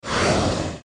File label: chimera SFX; chiikawa-big-event; Chiikawa vs Chimera